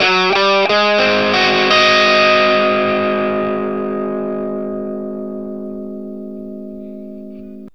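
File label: PICK G sharp 7, Vintage Blues Guitar; USB Soundscan vol.22; AKAI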